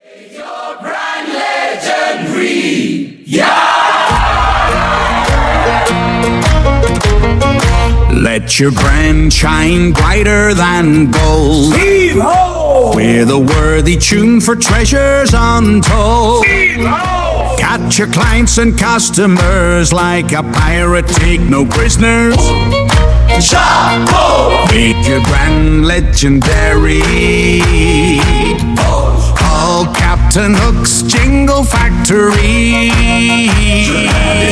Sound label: jingle; Captain Hook's Jingle Factory